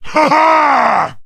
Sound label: man yell; Mount&Blade Warband